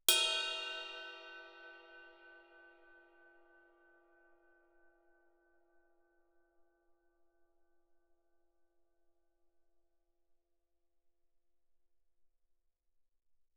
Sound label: ride stick bell ff, suspended cymbals; Percussion